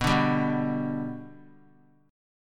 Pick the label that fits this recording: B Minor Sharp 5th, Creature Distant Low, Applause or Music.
B Minor Sharp 5th